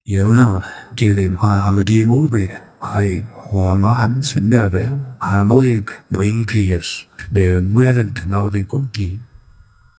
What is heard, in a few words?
devotional